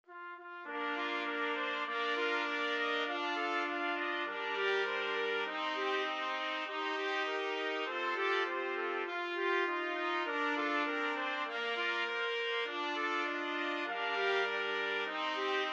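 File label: 4 trumpets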